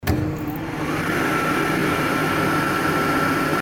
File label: elevator fake